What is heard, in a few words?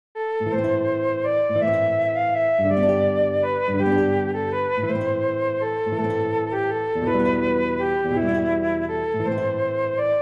greensleeves flute and guitar; christmas music and carols